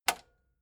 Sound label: metal button spring click fast